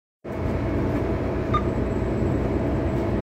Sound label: arc beep